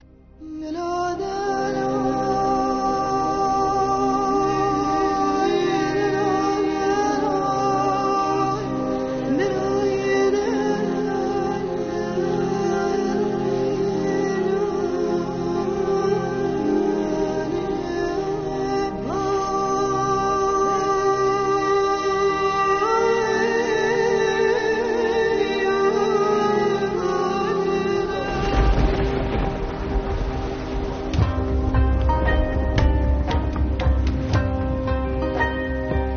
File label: Instrumental